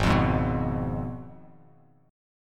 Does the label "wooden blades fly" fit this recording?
No